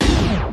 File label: stab; Zero G; Man Machine